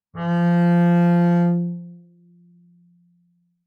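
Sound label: Bass arco fortissimo sul G F3, Double Bass; MIS Pitches - 2014; Strings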